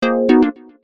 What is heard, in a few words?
fail